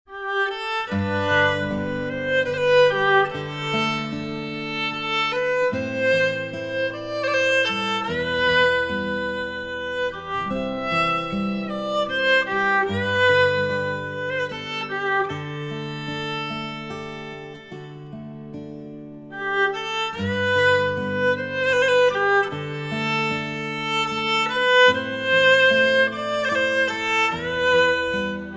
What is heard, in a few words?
Fiddle And Guitar